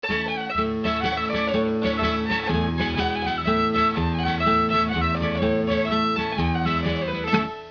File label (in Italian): chitarra